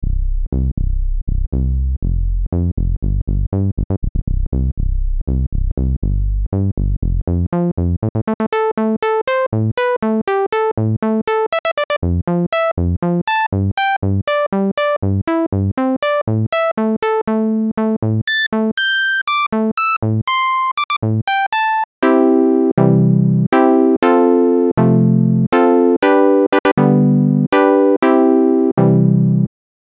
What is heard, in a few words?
synth1 vsti filter demo lpf12; ichiro toda